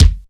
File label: 133 Ragga & Dub Reggae BD + HH R; USB Soundscan vol.27; AKAI